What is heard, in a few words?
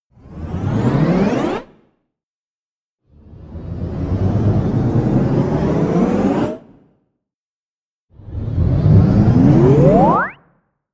charge